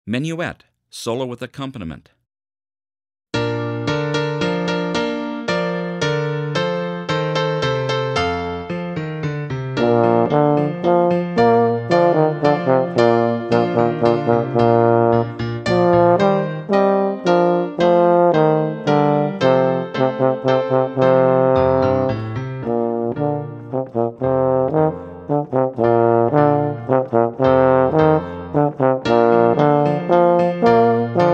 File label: Menuett, Solo with Piano Accompaniment – Performance Tempo